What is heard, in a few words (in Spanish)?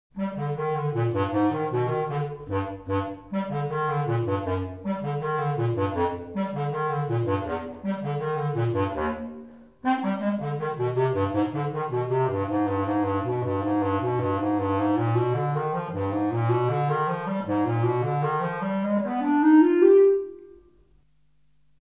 Sonido de clarinete bajo; Instrumentos de viento; Viento-madera; clarinetes